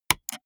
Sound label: gaming mouse left click single hard